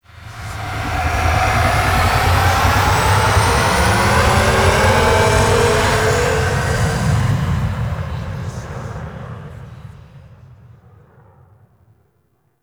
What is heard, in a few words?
Undocking, Vehicles; Flight; Warframe; Liset; Stalker Liset Skin